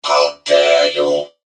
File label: mech hurt vo